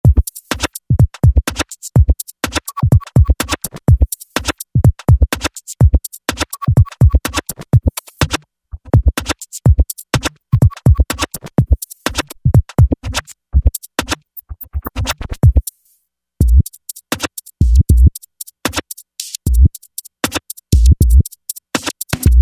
Scratchy KIT; Factory Patches

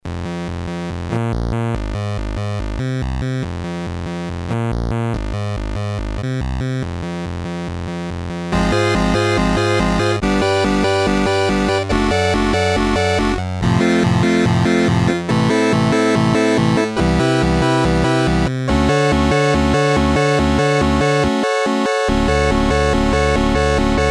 Octave Synth, Crumar Trilogy

